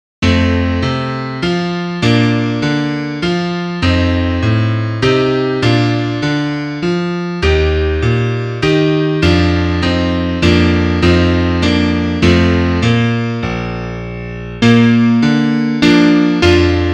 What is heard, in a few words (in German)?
Klavier Solo, trompete; Ein Lied für dich